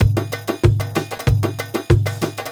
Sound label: bollywood, Drum loops